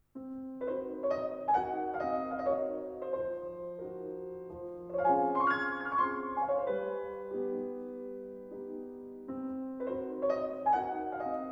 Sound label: Arr. piano, Polka; Op. 22b